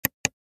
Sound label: Long click